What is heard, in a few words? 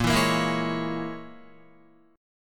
Bb Minor 7th Flat 5th, Guitar; Bbm7b5 strummed